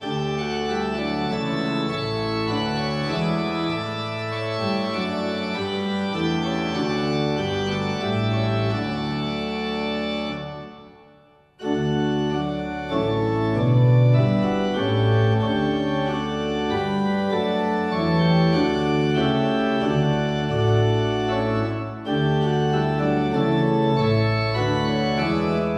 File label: organ, hymn; halton holgate; Hymnal 1982